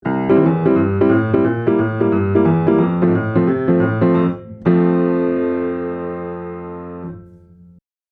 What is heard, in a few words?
Piano Norm Limit Hard Knee Att1ms Rel200ms minus2dbfs